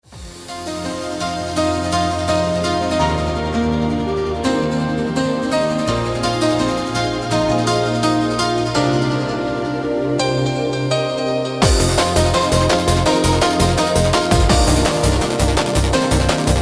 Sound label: Eurodance; Apple iPhone